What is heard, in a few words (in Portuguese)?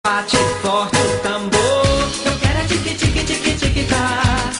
bate forte o tambor